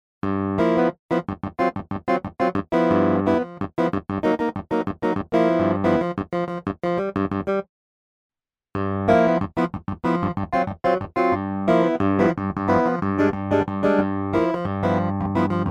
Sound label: CS Phaser; BOB CS PHASER